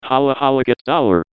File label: battle cry; John Madden